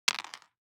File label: DICE on Cardboard, Throw and Roll, Mini, 3 Three Dice; Samples; ArticulatedSounds; DICE Sfx Party (Extended)